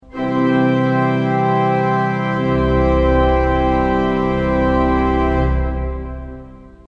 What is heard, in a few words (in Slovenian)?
zbirnik fortissimo